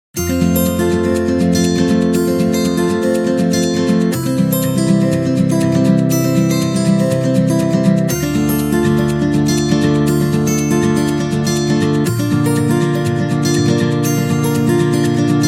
Love Happy

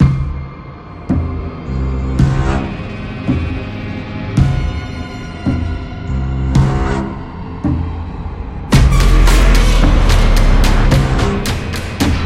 Horror Violin

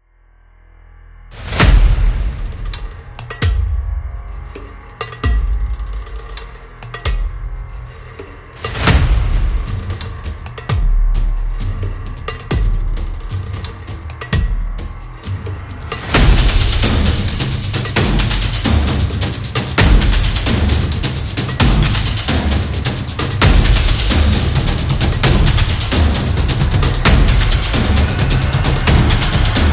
Cinematic Drum; battle drum

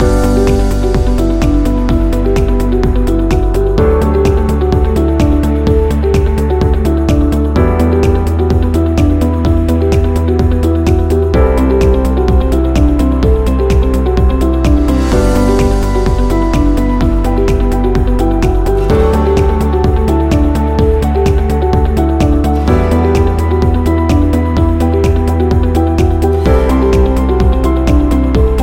Promo Loop Corporate

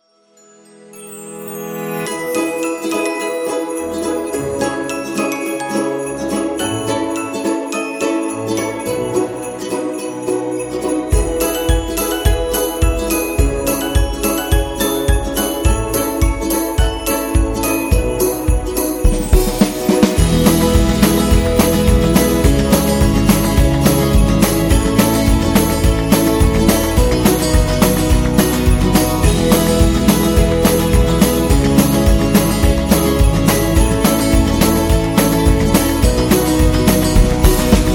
This Happy Winter

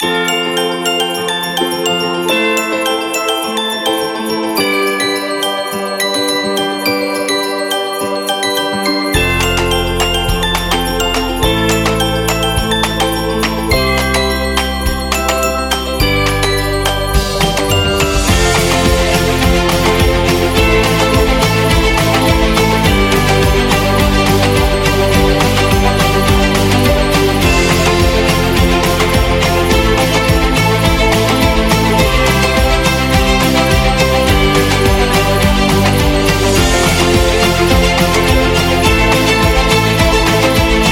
Merry Christmas

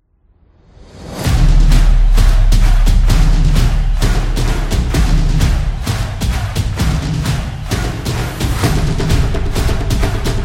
Barcelona

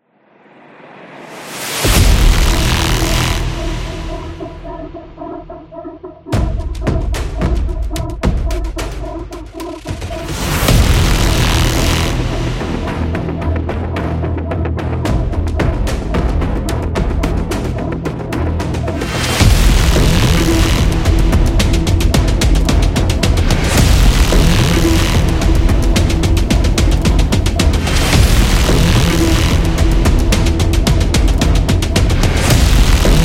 Aggressive Hybrid Military Intro

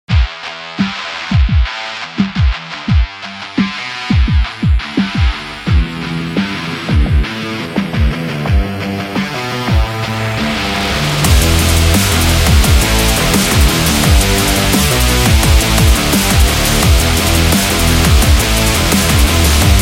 Dubstep Heavy

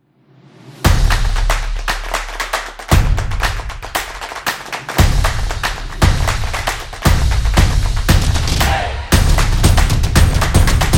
Drums Beat

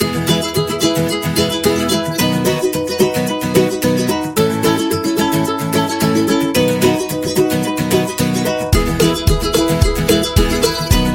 Opener Happy

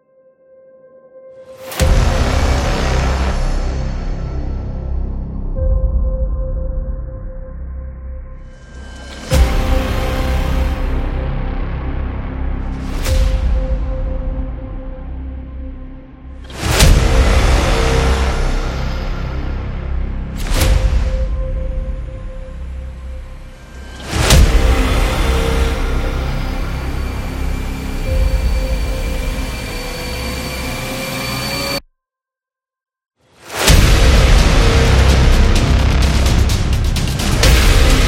Hybrid Trailer, thriller

